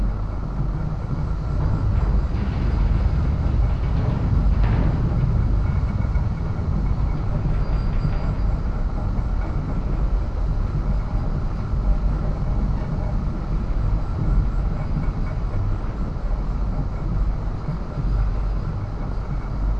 scavenger bot move loop; Stellaris; distant stars

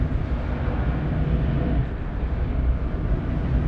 distance hive minion idle; Stellaris; guardians

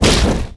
swarm scroge missile fire; weapons; Stellaris

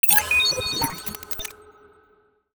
asset acquired positive; Stellaris; nemesis

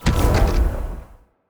healing hit, weapons; Stellaris; biogenesis

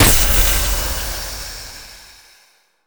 voidspawn missile; Stellaris; distant stars

